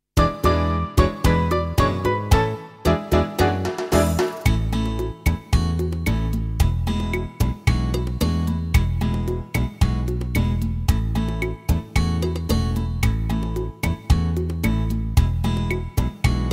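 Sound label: Samba, gitaar; Brasilia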